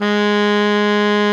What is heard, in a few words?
BARI MF G#2, Sax; Giga Samples Collection; BARI 3-WAY